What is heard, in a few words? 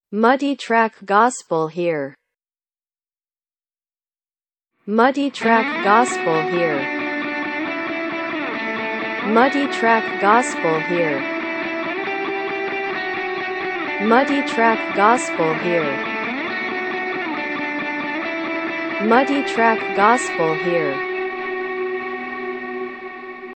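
electric guitar Solo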